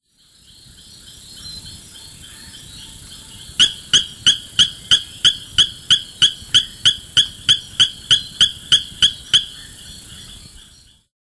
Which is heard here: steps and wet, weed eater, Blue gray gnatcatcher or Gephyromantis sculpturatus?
Gephyromantis sculpturatus